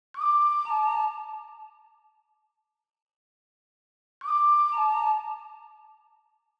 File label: 118 BPM G Min Ocarina, melody loop stem; Trap; Loop Stems; Cymatics; 2022 Melody Collection; Trap Pt 2; Panama